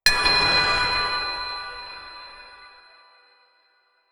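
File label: a soft bouncy metallic clink, arcade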